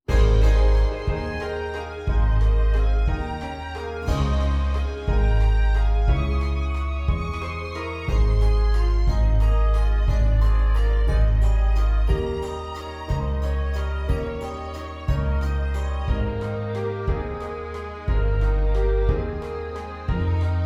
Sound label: Waltz; Twelve Dancing Princesses